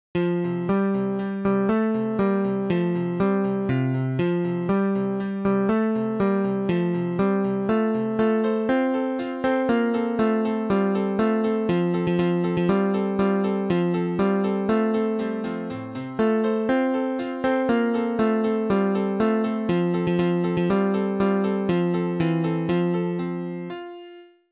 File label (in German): Hymne